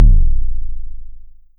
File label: Vermona Kick; techno drum fx; Analogue DrumHits